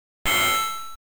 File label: anvil ding, Amiga